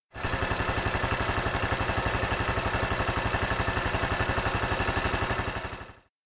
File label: bike idle; anemoi